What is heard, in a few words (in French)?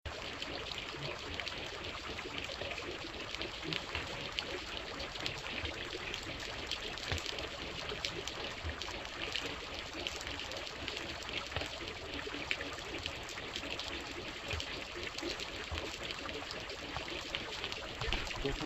pluie bizaroide